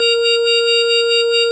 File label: ORG Vox A#, Continental Vox Wah Bar; Roland LCDP09 Keys of the 60s and 70s 1